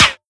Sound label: flavor metal, bullet impacts; physics; Half Life Model Pack